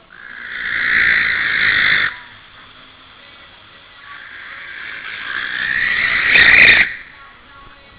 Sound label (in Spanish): Tyto alba Adulto